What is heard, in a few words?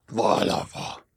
Stag